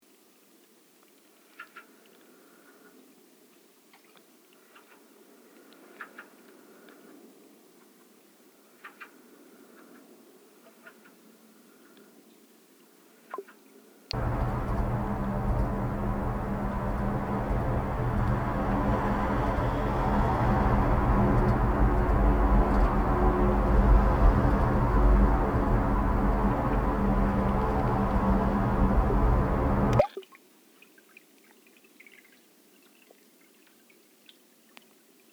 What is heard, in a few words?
Field recording Utrecht kanaal